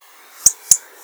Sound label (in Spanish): espartillero enano